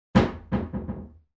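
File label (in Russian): закрытие дверцы на общем плане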